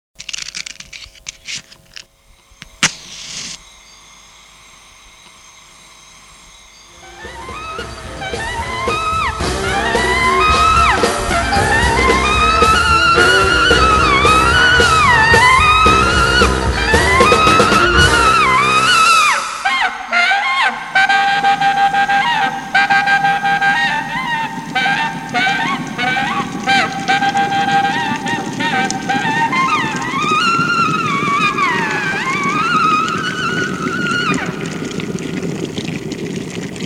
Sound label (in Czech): znělka začátek sax